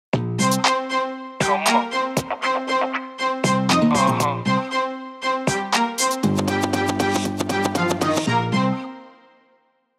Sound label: Hip-Hop, ringtone